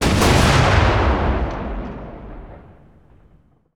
super heavy battleship attack; Hearts of Iron IV Death or Dishonor

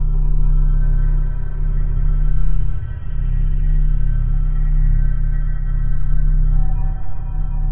Reactor Room; Duke Nukem Forever

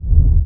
worm rumble; Emperor - Battle for Dune

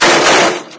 roust crash, materials; Garry's Mod; hl2

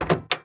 mech latch; Sid Meier's Civilization 4 Complete; Beyond the Sword; Units

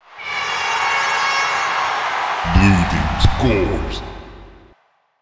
cheer Blue Team; BaboViolent 2

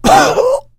choke, survivor voice; Left 4 Dead 2

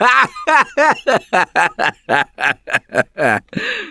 Laughter, Survivor Voice; Gambler; russian

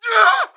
normal dying; Emperor - Battle for Dune

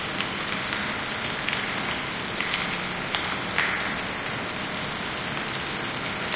int rainverb med loop, rainscapes; ambient; ambience

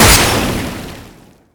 ceda jar explode; weapons

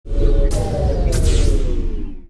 destroyer run; Sid Meier's Civilization 4 Complete; Beyond the Sword; Final Frontier; Units

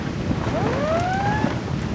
heavy cruiser damaged attacked loop; Hearts of Iron IV Death or Dishonor

